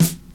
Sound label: good snare drum sample e key